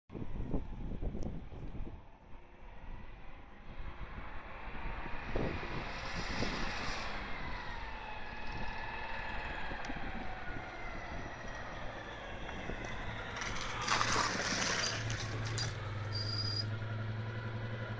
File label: Dyane, اگزوز